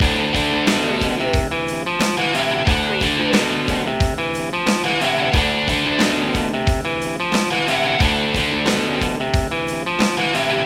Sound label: grunge loop